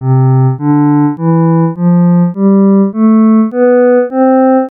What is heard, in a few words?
violoncello